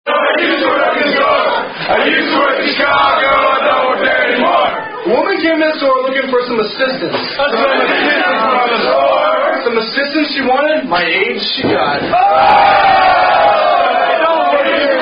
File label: rugby drink up song; Wou Rugby; I Used To Work In Chicago